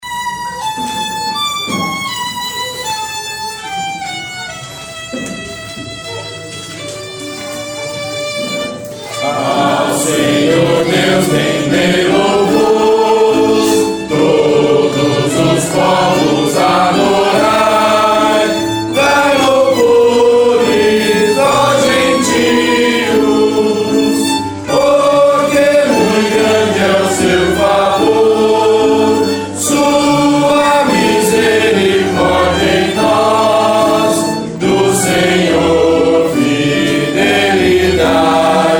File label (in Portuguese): salmo cantado